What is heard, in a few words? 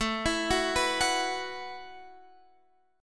E Suspended 2nd Suspended 4th A